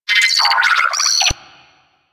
Ship Advert Bot Chatter; Ambience; Props; Warframe; Corpus Ship Remaster

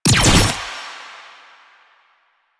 Gunbrella Alt Mech, Weapons; Warframe; Tenno; Koumei

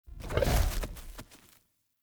Wolf Steps Run Start; Animals; Foley Actions

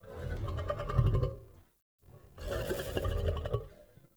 Wolf Growl Relaxed Jog; Animals

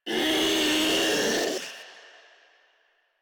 Infested Ancient Vocal Attack; Enemies; Proto Infested Ancient; Warframe; Ancient Ninety Nine

